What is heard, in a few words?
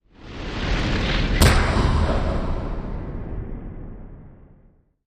Course Rumble And Hard Impact Sweep